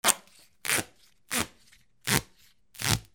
RIPPING

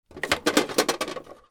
BATHROOM

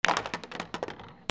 BILLIARD

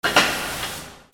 INDUSTRY

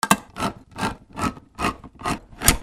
KITCHEN

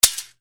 BREAKING

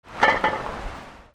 BANGS

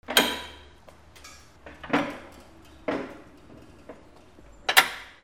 DOORS

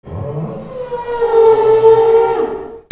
SCIFI

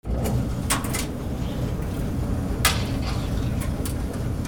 HOUSE

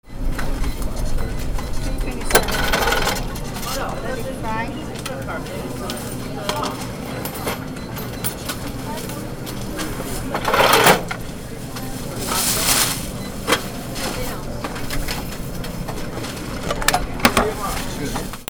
AMBIENCE

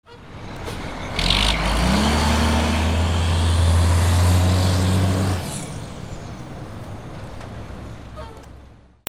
VEHICLES

